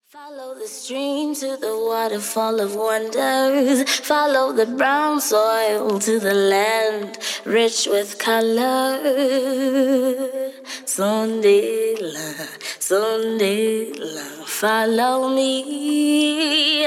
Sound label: acapella